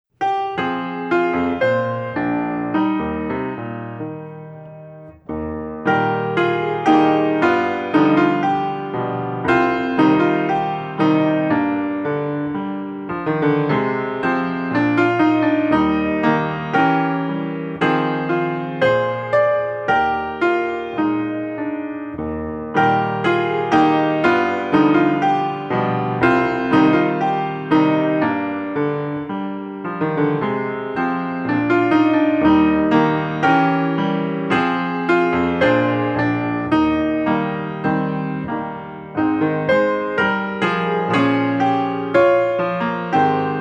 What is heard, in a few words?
accompaniment; peter pan